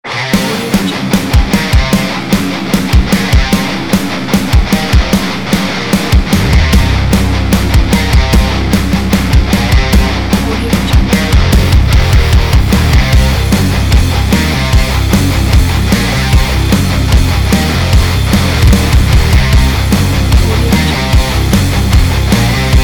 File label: heavy metal extreme agressive riff